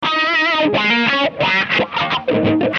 Eb 080 100 solo Wah

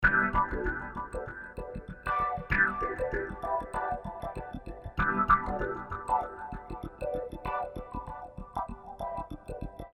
Swinglefunk

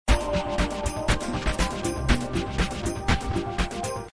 120 Cm7; Triumph

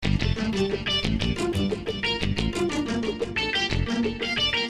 Gtr & Pizz Echoes 90 Gm7

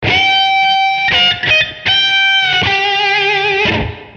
C 90-105 blues lead

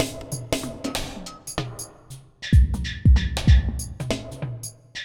Deep Mover 95

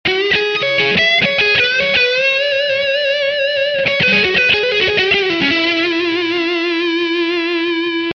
Db 95 120 lead

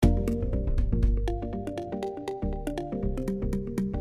Mallimban C7 120

